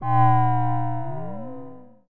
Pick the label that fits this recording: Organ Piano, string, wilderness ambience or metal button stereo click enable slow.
string